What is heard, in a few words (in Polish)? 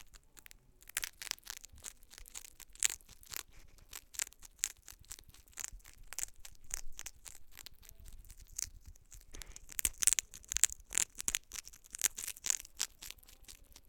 jeż w trakcie jedzenia; Dzieciaki Ratują Zwierzaki